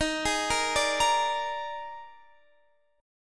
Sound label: Eb Major 7th Suspended 4th Eb; EbM7sus4; arpeggiated